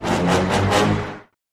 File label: Dramatic Movie Bit